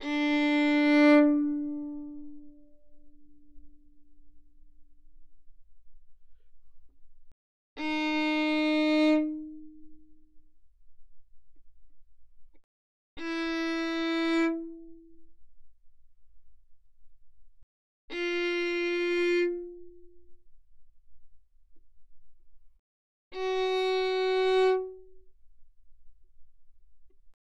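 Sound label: Violin arco fortissimo sul D D4 B4; Strings; violin2012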